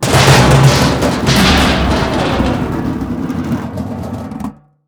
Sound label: metal clang